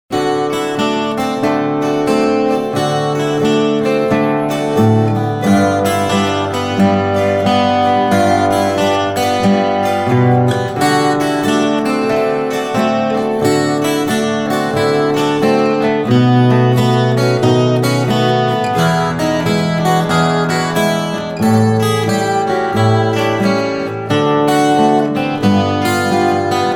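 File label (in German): Gitarre Solo; blues; ragtime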